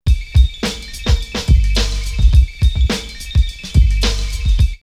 Shimmer 106, drumloops OldSchool; X-Static Goldmine

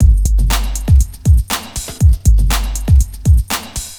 NRG Break, Trance, Drums, Breaks

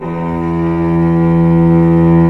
CELLO E 1

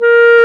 WND CLA-C, Clarinet; Roland L-CDX-03 Disk 1; Clarinets

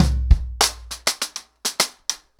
100BPM, ACOUSTIC DRUMS; The Roots Drumloops

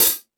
Mega Drums, Drum Machines

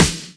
Snares; Vengeance - Essential Clubsounds Vol.4

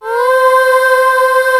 SOUNDTRACK, KAWAI; Rubber Chicken Software Sample Bank

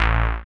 synth house, dance synth; Sound & Vision - Gigapack I CD 2 (Roland)

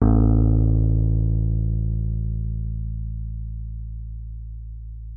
WURLITZER, A1; Xsample VOL-1; 90 s Sample CDs; WURLITZER RS

